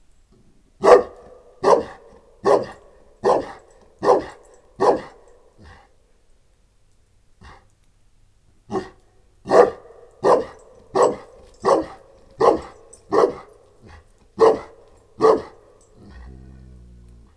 bernáthegyi moszkvai őrkutya keverék; eger turistahaz; parasztudvar

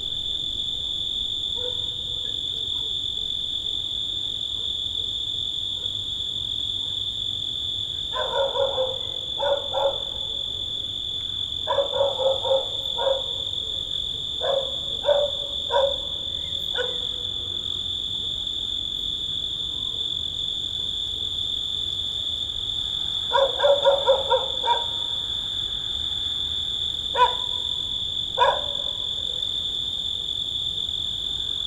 kabóca félék zakynthos, mezei kabóca; termeszetben

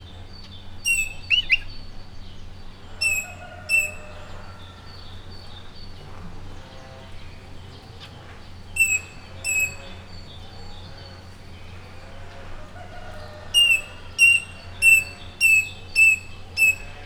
tavolban kakas kozepen rozellapapagaj, magyar tyúk; miskolc zoo

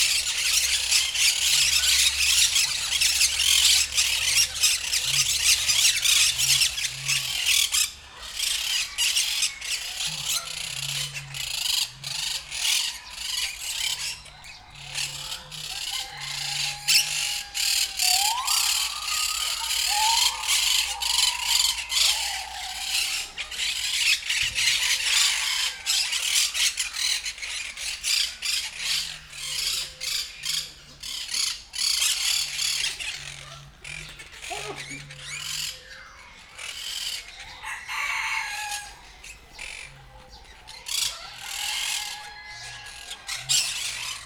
baratpapagaj; nyiregyhazi zoo 2019; mas fajta allatok is

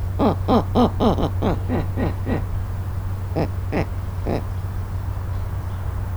megriasztott szélvédő, hóbagoly; állathangok; nyíregyházi zoo 2010

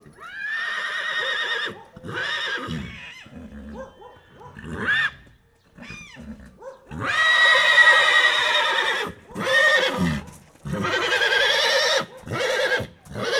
izgatottan nyerítő csődör, ló; állathangok; paraszt udvar

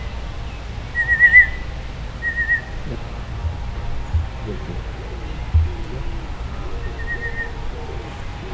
csipog a fióka dobol a mama, emu; gyori zoo 2014

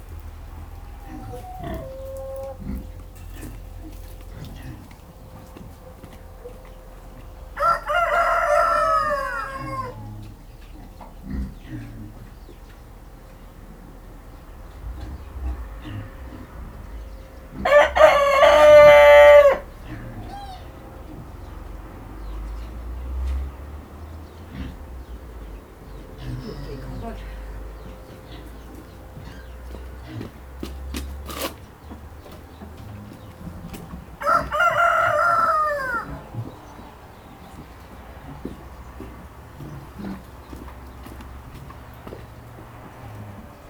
disznó tyúk kakas autóforgalom; parasztudvar

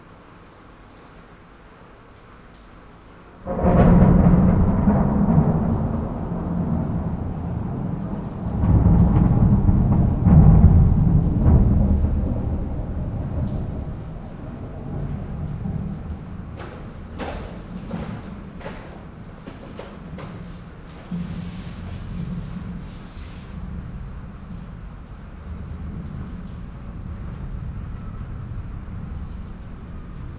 mennydörgés hangja a csimpánzok szállásából; pecsi zoo 2017

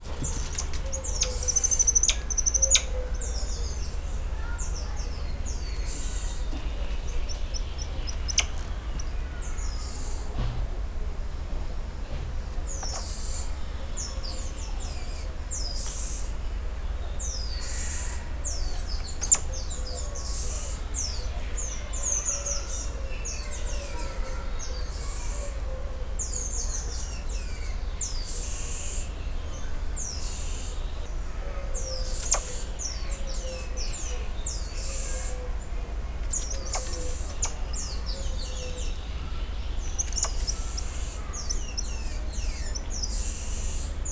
mélyebb háttérben lisztmajmocska, Goeldi tamarin; szegedi zoo 2011

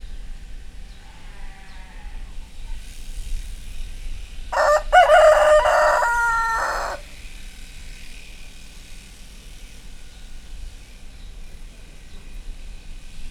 kukorekol, magyar tyúk; miskolc zoo

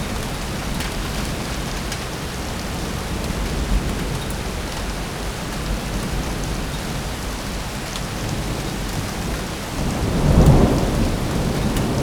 inkabb eso, mennydorges

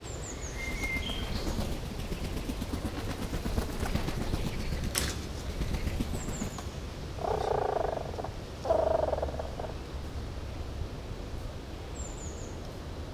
ide oda repked kurrog szél, kookaburra kacagó jancsi; allathangok; veszpremizoo 2013

